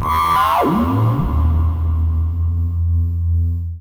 Monotron Sci-Fi

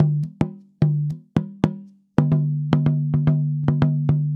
Tambora, African

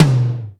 GOGO, GO GO DRUMS; Crypton - DrumBase5000

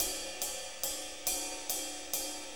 6-8 Ride, HiHat & Ride Loops

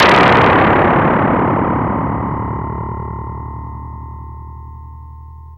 synth bomb; Zero-G Ambient 1; SYNTH BOMBS1

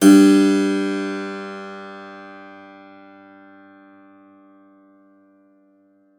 SPIN F#2, SPINET; Xsample VOL-7

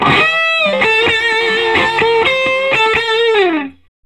Vintage Blues Guitar, SOLO

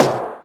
Percussions

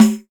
Pandeiro Open; EMU Planet-Earth

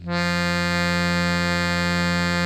ACC C2 DBL, ACCORDION; InVision Interactive; Lightware VOL-1; Instruments & Percussions

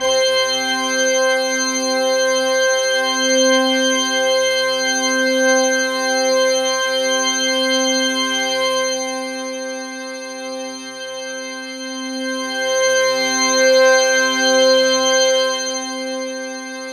C4, SynthLine; 90 s Sample CDs; NiceBeats; SynthLine VOL-11

